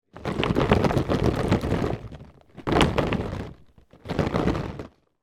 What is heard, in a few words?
food cherry tomatoes shake and roll around in plastic container